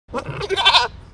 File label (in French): chevre